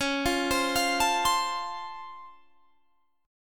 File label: Db Augmented Major 7th, down, Guitar, Standard; arpeggiated; JGuitar